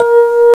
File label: Rhodes; fairlight cmi